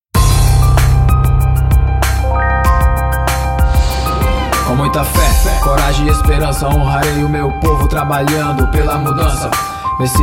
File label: rap